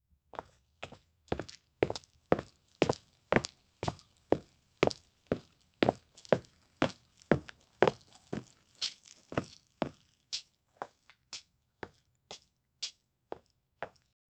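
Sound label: steps with boots on concrete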